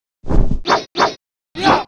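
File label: Mob attack; Bossmob; 금전방; 황금제강귀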